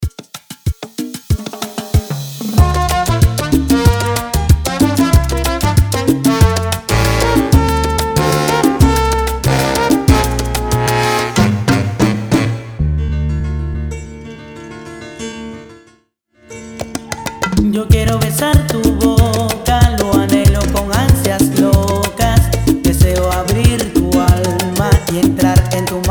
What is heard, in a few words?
94BPM Intro Outro